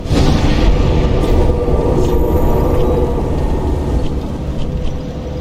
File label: bonfire activate; Dark Souls III